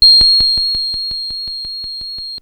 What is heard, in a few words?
buoy high; Fender Chroma-Polaris